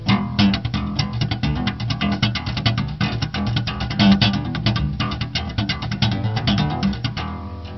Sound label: happy to be slapping bass; MELODIC SAMPLES